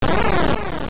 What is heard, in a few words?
Boss Hit; A boss hit; Zelda Oracle